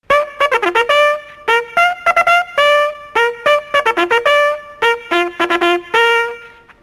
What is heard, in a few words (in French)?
trompette